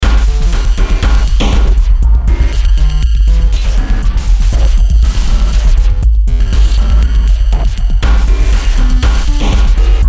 BEAT ABSTRACT, Beat Abstract Tripcore; Miscellaneous Loops

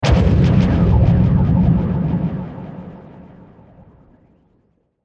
Hit Swirl Distortion Delay, Lfe Hit Double Tremor; Production Element Imaging Element Accent Transition; Blastwave

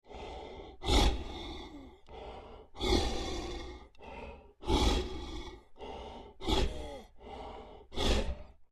Creature Large Breaths Deep Laboured; Scary Breathing Sound Effects

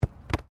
RUGBY BALL BOUNCE CATCH; Various rugby sounds; Miscellaneous Sports

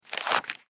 Money Count Bill Single; Foley; Objects

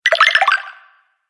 Fantasy Game Collect Sound, video game

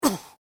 Pain Umph, Male Painful Grunt, Hurt

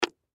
Candy Set Down Tin Foil; Foley; Set Downs; Objects; Blastwave

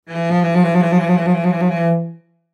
Cello Trill; String; Strings; Blastwave

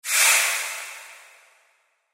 Sci Fi Air Expel Hose Pneumatic Burst; Spacecraft; Blastwave

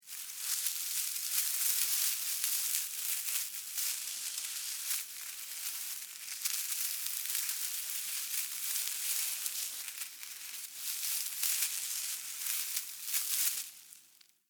Critter Movement, Grass, Foliage; Animal; Flies; Animals; BlastWave

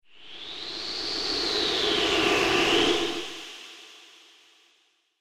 Graveyard Wind; Scary Soundscape Sound Effects

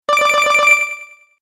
Game Echo Bonus, Video Game Sound Effects

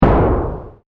Nice And Strong Impact, low impact; impact sound effect; found sfx; Andrew Fleming

